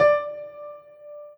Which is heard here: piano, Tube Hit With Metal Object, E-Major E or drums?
piano